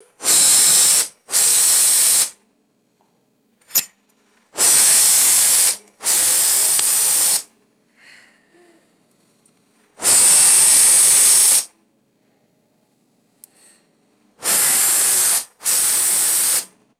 explosive compressed air bursts and metallic handling